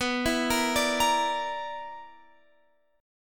B Minor Major 7th Double Flat 5th, Guitar, Open F; BmM7bb5 arpeggiated; JGuitar

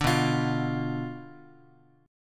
B Diminished B down Guitar; Bdim strummed; 5,7,8,x,x,x

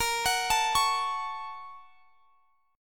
Bb Minor Major 7th Sharp 5th; arpeggiated; x,x,20,23,22,21

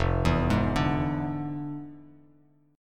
F Minor Major 13th, Bass Standard; arpeggiated; JGuitar

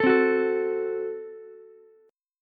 F sharp Diminished F sharp, Ukulele; strummed; 14,12,14,12

